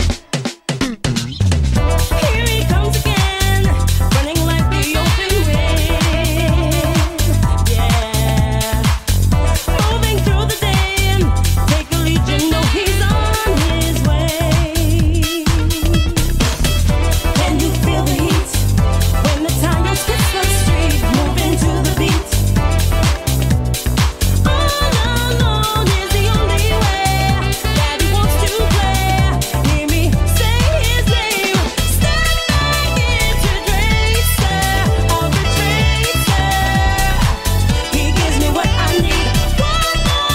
Jingle Act Complete; Sonic Momentum; SAGE 21

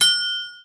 Tally increase; Jessie's Wild West Rodeo

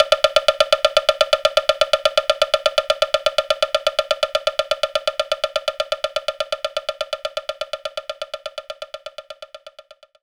Wood Block Hard

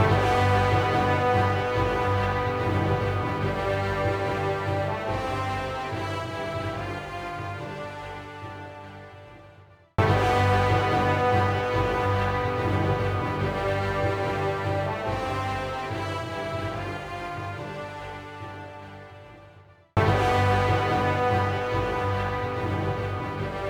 Battle Fade; Might and Magic Clash of Heroes

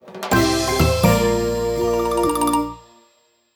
Fail; SFX Battle Ingame

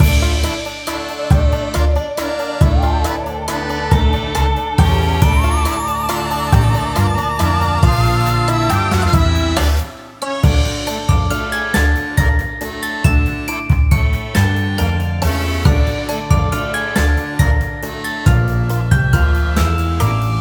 Nest Dungeon haunted; vivid knight switch windows gamerip 2021

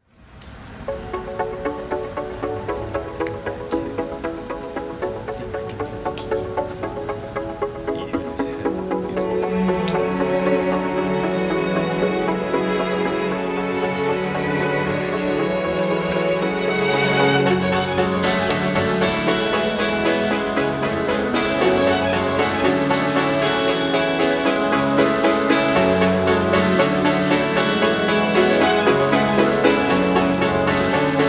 Instrumental

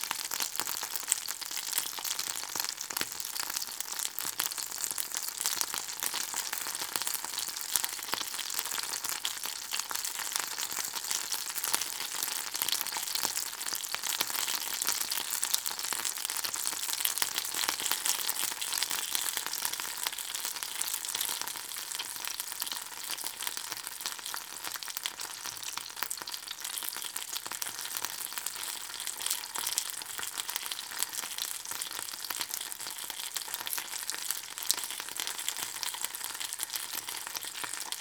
slow frying looping

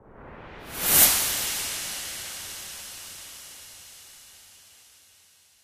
Swell; friday the 13th killer puzzle

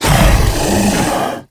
damage reaction

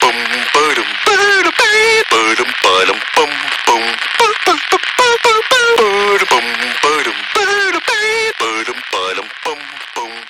Incorrect Puzzle Synchronization

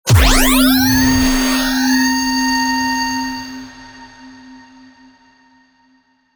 Low Cinematic Impact

